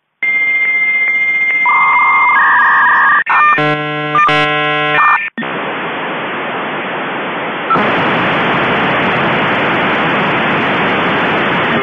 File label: 56K Modem